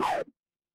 retro game laser

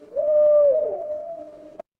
animal owl

